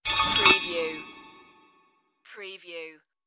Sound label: magic exit swirl